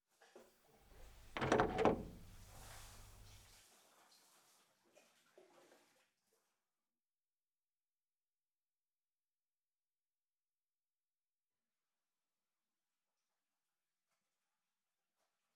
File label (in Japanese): お風呂で内緒の浮気セックス, ASMR